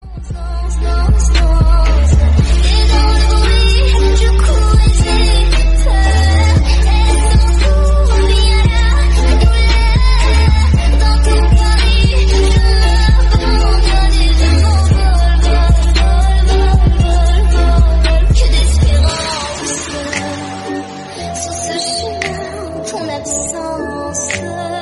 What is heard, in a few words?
Hollywood Instrumental